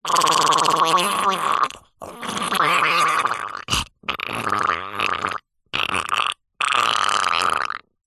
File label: human male strangle choke die vocal; zapsplat